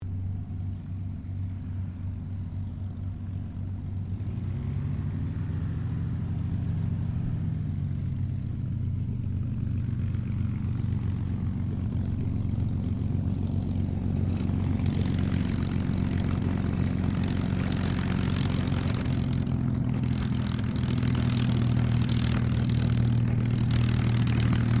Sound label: AIRCRAFT CA-18 MUSTANG TAXI; P51 /CAC-18 Mustang; Airplanes; Vehicles